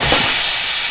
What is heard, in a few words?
vent loop start